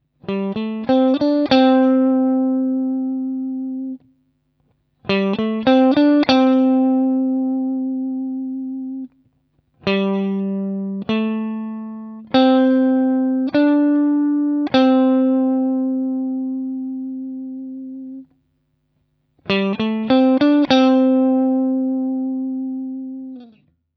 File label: Vibrato Lick, guitar